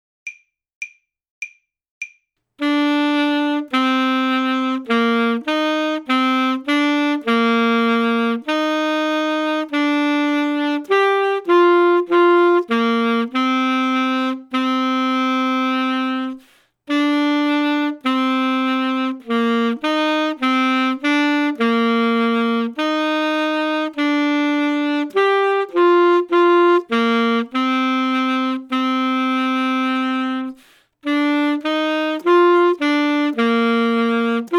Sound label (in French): air de cour v3, saxophone